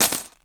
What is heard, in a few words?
coins bag